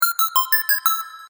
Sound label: jingle; ユウラボ8bitサウンド工房; ミステリアスな感じ